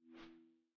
Global Hint Hide; Game rip; MiSide

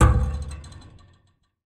Ventilation Hit; Game rip; MiSide

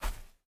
Step Grass; MiSide

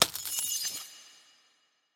Interface Back; Game rip; MiSide

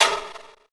Player Defeat; Game rip; MiSide

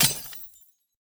Shield Destroy; Game rip; MiSide

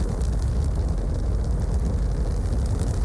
Fireball Fly; Game rip; MiSide

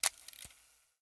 Camera On; Game rip; MiSide

